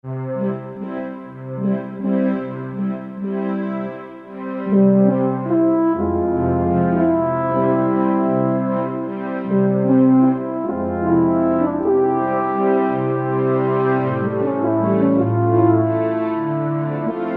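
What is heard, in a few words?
String Horn, Korg; Strng-Horn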